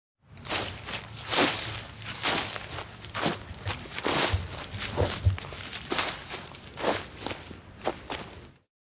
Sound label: Rak Leavs, Raking leaves in yard.; Raking Leaves 1; Yard Tools